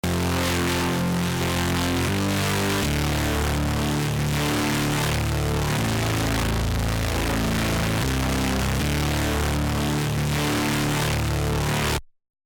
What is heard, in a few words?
A Power Fifth